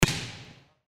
volleyball tap serve

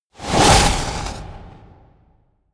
whoosh flutter

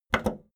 video tape player plastic play click fast

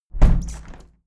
window plastic squeak close heavy sequence

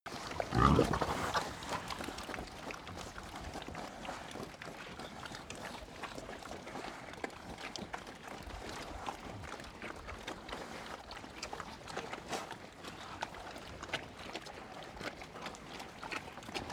pig herd eat long

